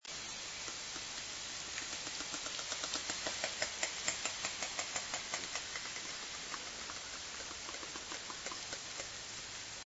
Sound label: J clicks, orca